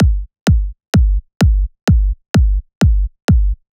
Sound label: Big EDM Kick Loop, 128 BPM; Future House Tools